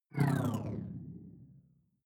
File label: sci-fi alien big bionics down; swooshes-swishes